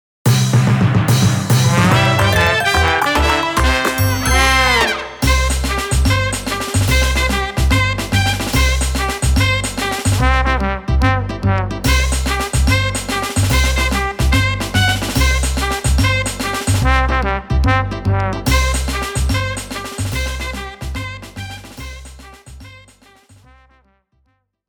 stage clear jingle; Midnight Carnival; LocoRoco Midnight Carnival

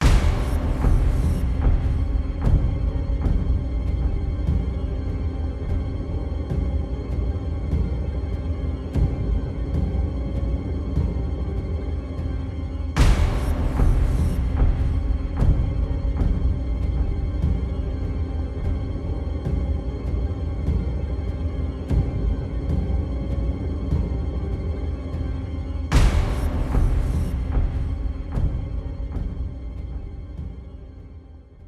Alert Loop

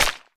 Disc Rico Stone; Boomerang Fu

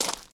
Foot Gravel Shoes Walk

